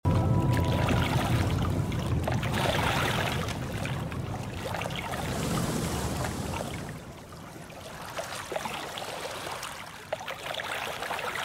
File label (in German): fluss; The Flame in the Flood